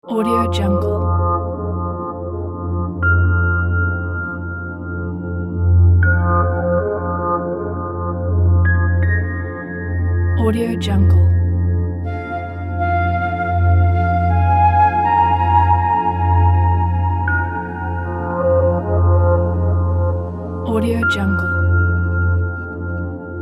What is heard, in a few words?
mysterious intro